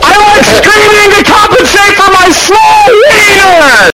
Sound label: I LIKE SCREAMING